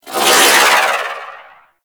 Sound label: Arch Wing Prime Dodge Roll; Warframe; Vehicles